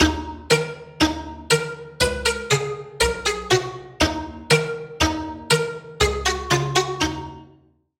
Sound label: Vox - Tiny Vox; Routed Sound; Serum 2 Expansion; Story Walker Vol.1